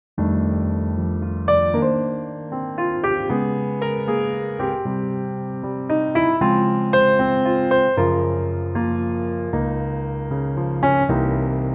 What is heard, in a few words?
F Piano